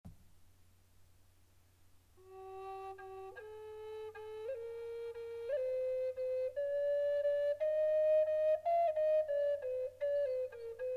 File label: triple flute contre bass sol re 432; triple flute amerindienne contrebasse re sol sol